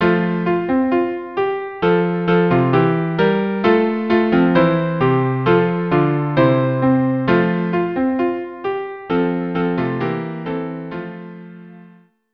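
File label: string trio; mi yemalel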